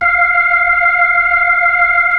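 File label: B-3, Hammond B-3 organ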